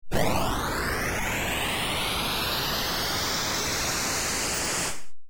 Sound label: engine up